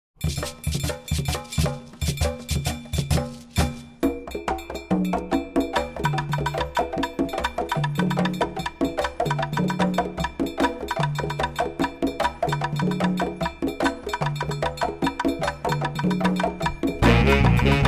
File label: Drum; Seguito's Too Tonal Free Drum!